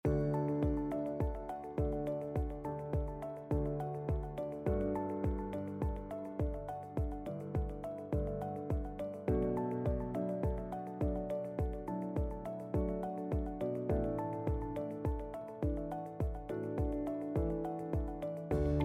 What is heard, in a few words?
Motivational